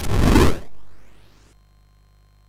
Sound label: MOS6581R3, sid; Powers of Gloom; hvsc